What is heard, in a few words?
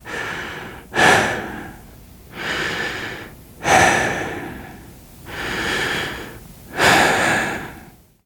exertion minor, survivor voice, biker